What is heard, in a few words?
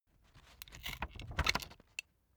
puncher operate slow